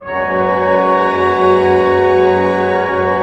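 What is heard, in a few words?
pentatonic F# pianissimo, orchestra; Sound On Sound - Peter Siedleczek's Orchestra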